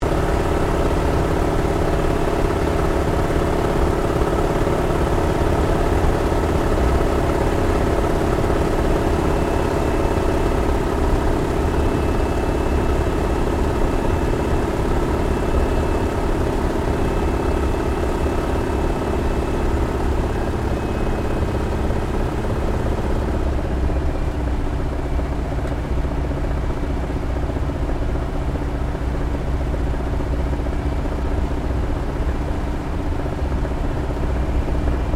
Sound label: AIRCRAFT CAC WIRRAWAY APPROACH INTERIOR; CA-16 Wirraway; Airplanes; Vehicles